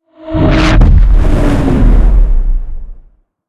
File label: shell passby